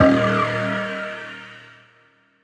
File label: Riddim Synth Hit D#; One Shots; Roach; Ghosthack; Riddim Essentials 2020